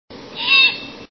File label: great horned songs; Bird